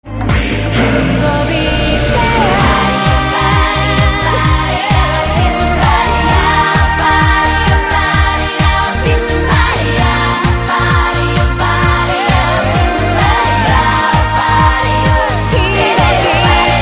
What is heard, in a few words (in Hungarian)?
csengőhang; magyar pop